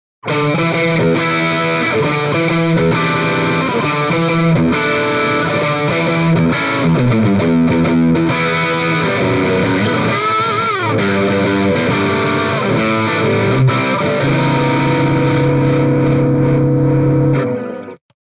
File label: Celestion Greenback G12M Distortion Stratocaster